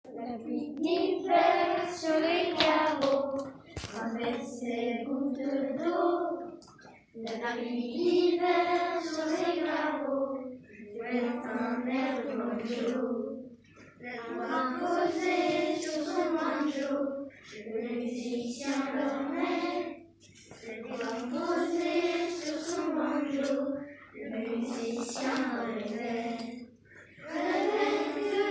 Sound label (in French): chant un air de banjo